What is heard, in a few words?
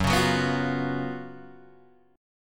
Gb Major 7th Suspended 4th, down, Guitar, Open G